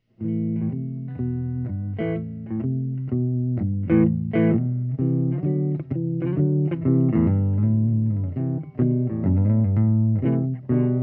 Dog Walk; (Original, in Am); Benedetto

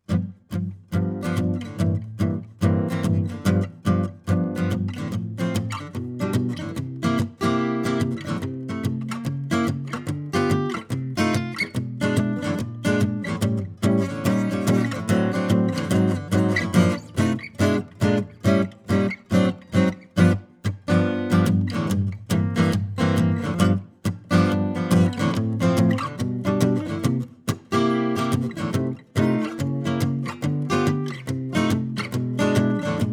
jazz; Neumann; M70 CAPSULE TESTS; SANTA CRUZ OM/PW

